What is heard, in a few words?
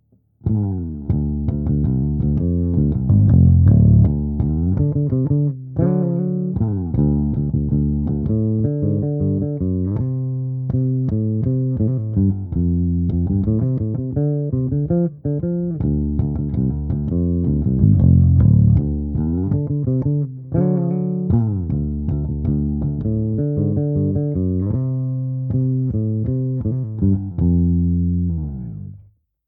Flying V no horn; LWA500